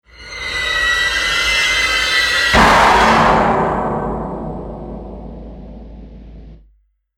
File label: Movie Impact Psycho; Miscellaneous Impact Sound Effects